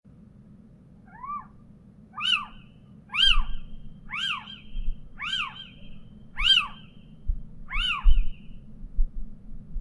cheveche d athena

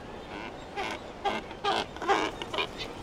cormoran huppe

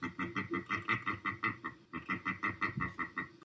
percnoptere d egypte; Bec crochu jaune; Chant saccadé perçant : {Ex} (keu)*; Cri : quasi-identique au chant

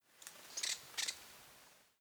mesange noire

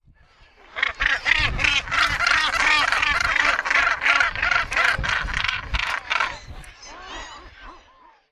fou de bassan

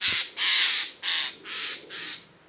geai des chenes

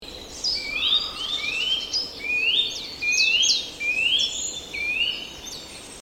sittelle torchepot

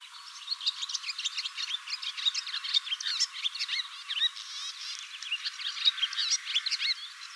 hirondelle rustique

